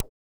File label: Pop; Ableton Live 9 Essential Training